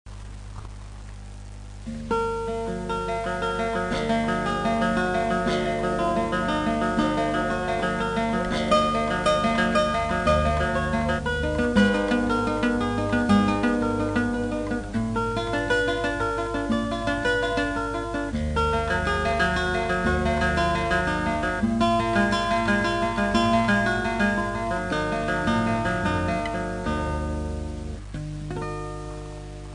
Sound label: guitar; クラシックギター